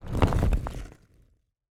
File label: Stone Move; Fantasy Sounds Bundle; Craft